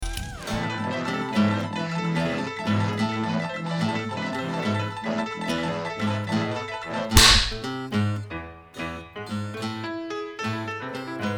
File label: Fast Waltz